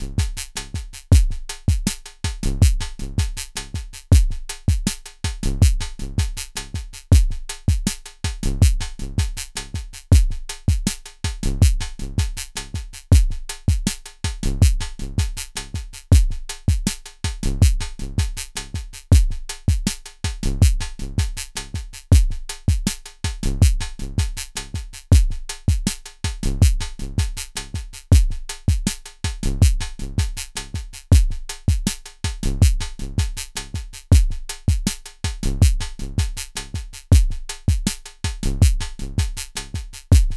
Delay Drums Pedal Software; BIAS Pedal

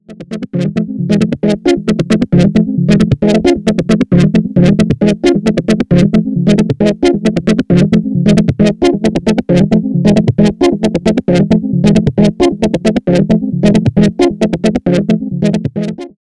Multi LFO Evolution

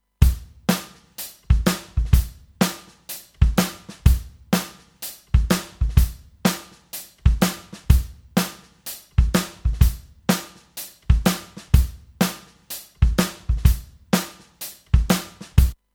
Gyraf G23 S Drums EQ Tube

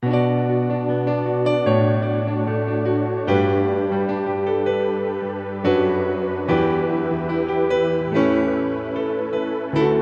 CFX Padded

